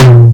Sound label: natural tom drum one shot a sharp key